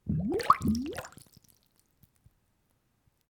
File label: Bubble